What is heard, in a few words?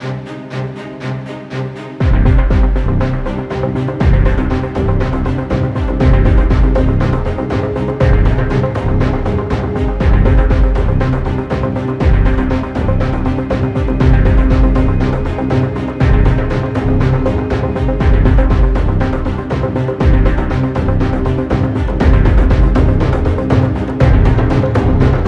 soft tension; by burak sound bank; tension gerilim vol 47